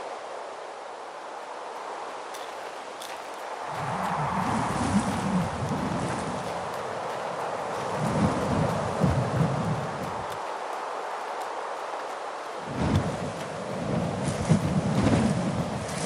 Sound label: ambient loop; Rabcat; north Storm